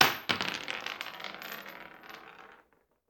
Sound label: Bullet Shell; Sound Ideas; Guns